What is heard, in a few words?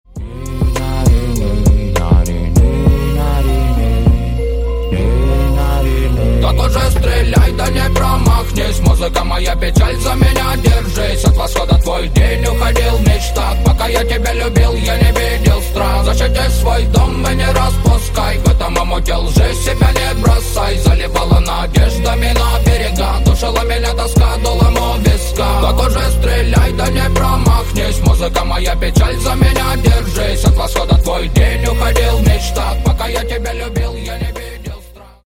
acapella